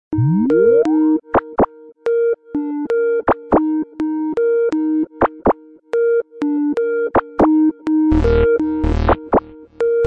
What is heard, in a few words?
skype remix